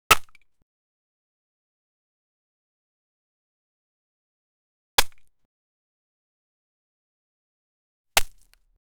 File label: a chunk of sulfur that